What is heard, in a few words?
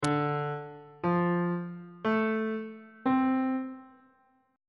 b sharp major 7th chord 2 is c double sharp minor 7th